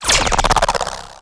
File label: bolt huge zap